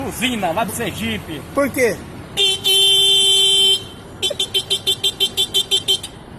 buzina do sergipe

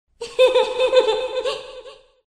risada assustadora

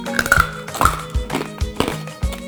comendo

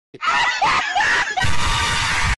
risada

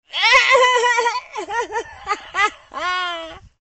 risada do maluco

